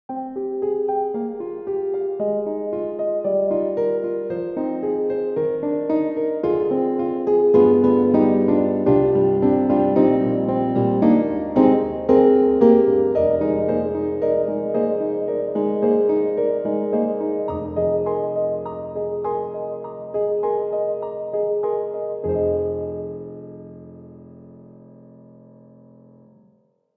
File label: Concert Grand; Native Instruments; Berlin Concert Grand; Mood